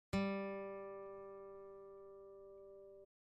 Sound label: Tuning G, guitar